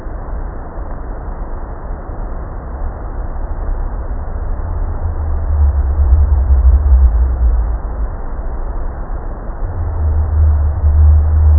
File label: pygmy blue whale nonsong